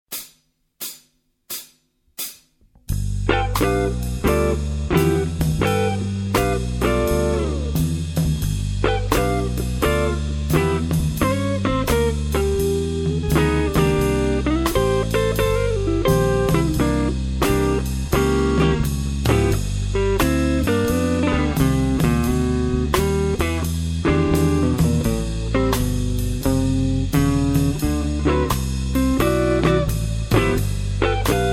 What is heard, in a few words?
blues jazz